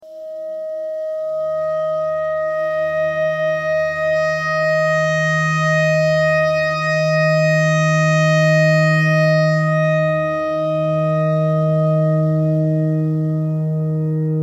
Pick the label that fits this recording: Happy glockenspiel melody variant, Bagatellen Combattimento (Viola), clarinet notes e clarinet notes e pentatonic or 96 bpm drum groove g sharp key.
clarinet notes e clarinet notes e pentatonic